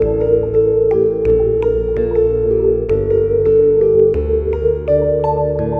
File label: Ghostly, Synth Sequences